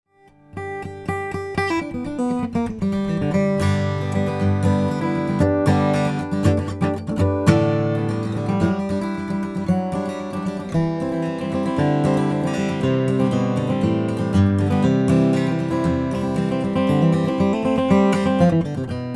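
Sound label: bourgeois luthiers choice brazilian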